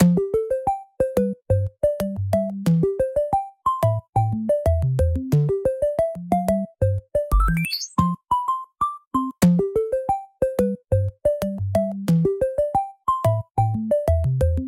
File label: indian melody ringtone